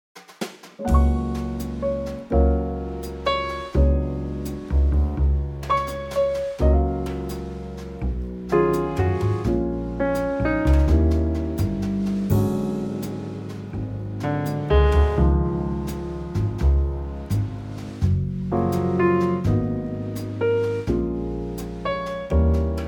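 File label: Gb Trio, Backing Tracks for Male Vocals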